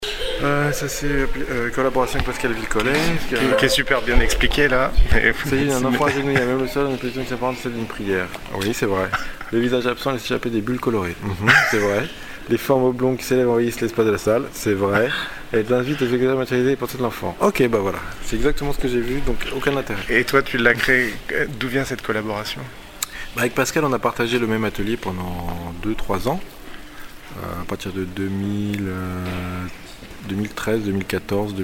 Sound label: a cappella; salle vilcollet